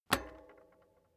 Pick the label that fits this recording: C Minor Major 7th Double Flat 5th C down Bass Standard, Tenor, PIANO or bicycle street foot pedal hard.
bicycle street foot pedal hard